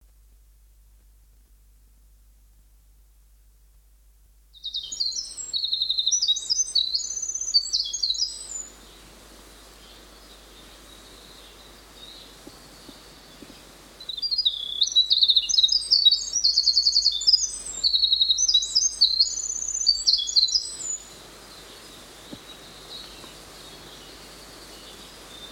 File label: winter wren